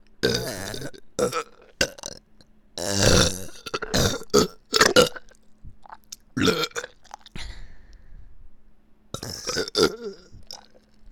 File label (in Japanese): 両耳 ゲップのみ